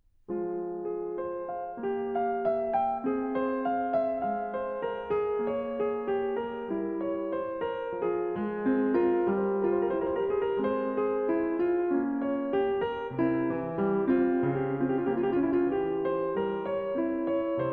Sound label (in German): Präludium f-Moll; BWV 857; J.S. Bach; Das Wohltemperierte Klavier Book 1